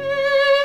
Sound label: violin